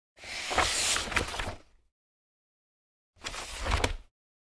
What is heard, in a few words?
Close book